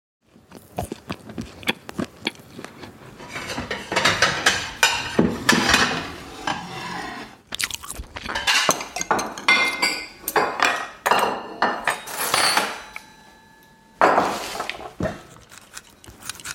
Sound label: interlude; hmmmm on ingere